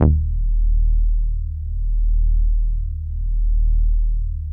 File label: Moog Taurus, EB1; Big Fish Audio - First Call Vintage Keyboards